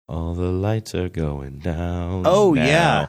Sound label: singing all the lights are going down now